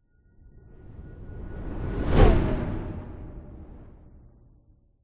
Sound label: sound design cinematic whoosh into slam impact dark